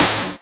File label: Clap; Ghosthack; Free Male Vocals and Atmospheres; Bonus Drums